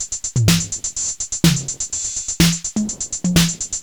drum loop electro top 125, Classic Chicago House; Sample Magic; Drum Loops

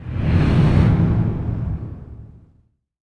IMP FLAME, Cinematic Impact

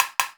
SN Cust, EMU Orbit-3; 300 Drum Machines

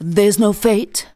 vocals sung; Techno Trance Essentials

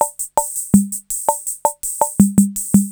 TR-66 SAMBA; Crypton - DrumBase5000

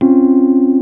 Rhodes Chord; Mo' Phatt; Digital Sound Factory Mo' Phatt

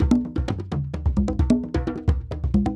African Drum 75 bpm; Quazibeat; African Secret; African Drums; 130 bpm

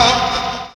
Fx Vox; Equipped Music; Loungin House

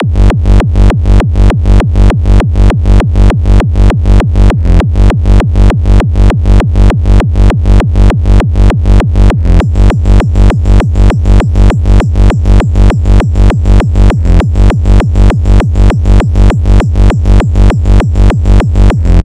Kick Frenchcore Fail

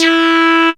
CREEPY E3, sequencer; Best Service Alpha Dance vol.2

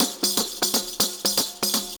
120 tamb

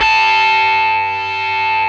GTR, Dirty Buzz; Club-50 - Foundations Roland

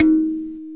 SANZA D#3; AKAI S-Series CD-ROM Sound Library VOL-5

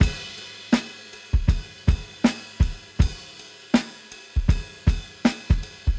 80 Classic Ride Hip Hop Clean, Live Jungle Breaks; LiveJungleBreaks Mini SP

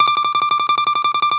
Balalaika, Prima Balalaika, Plucked Strings; E-MU Producer Series Vol. 7 – Old World Instruments

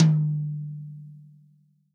TOM, Real Drum Kit, POP KIT

